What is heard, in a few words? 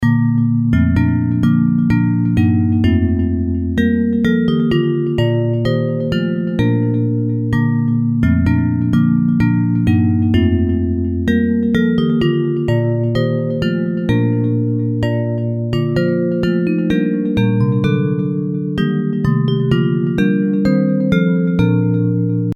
Bells, hymn